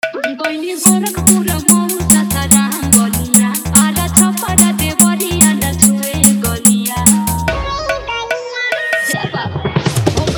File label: New Trending Viral Bhojpuri Dj Remix Song Hard Bass Mix